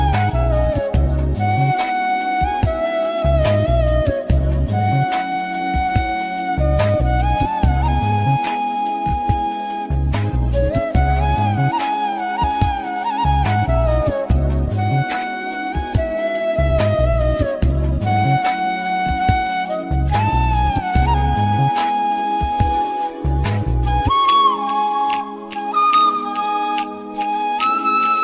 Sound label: romantic ring tone bollywood